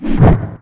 attack; 20th gibbet

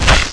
cleaner damage; jorgan

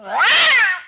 civil servant damage

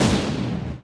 earth spike; wizard

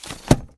equip item

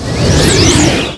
gloomundernight die

